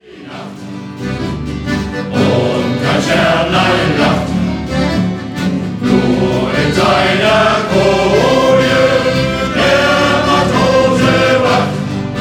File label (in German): Shanty Chor